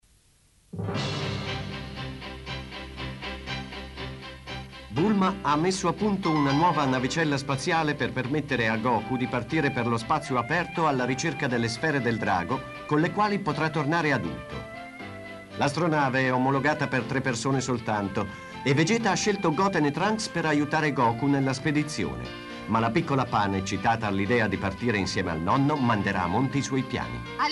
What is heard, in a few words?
vocal; Pan Italy; Italienische Stimme von Pan, ab dem Ciao!